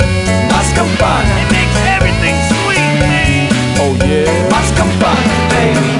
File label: Cowbell; Happy Feet Two; NDS